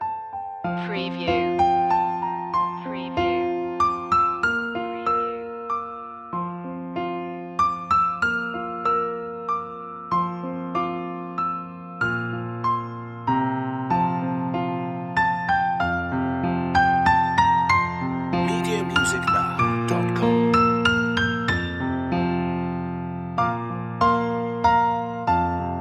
the first noel piano loop; media music now

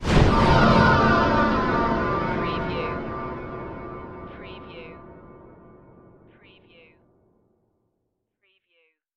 Hit Ghostly

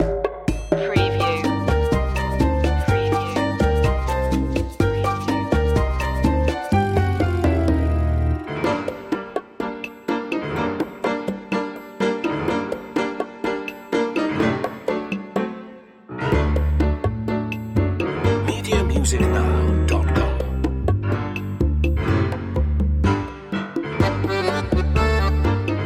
drama tango

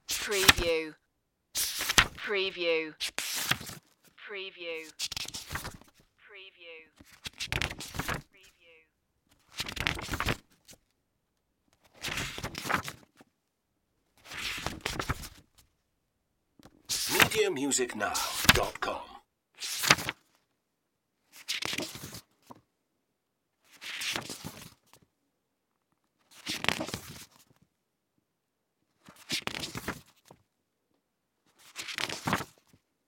turn over book pages

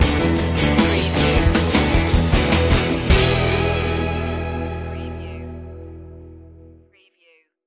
promising voyage stinger

